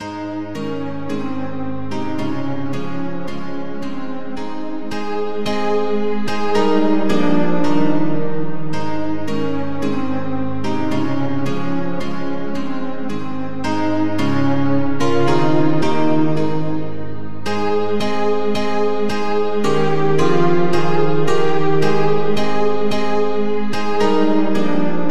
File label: polyinstru; hymns; Himnario grande